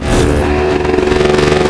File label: zapper warmup, flyer; svencoop; echoes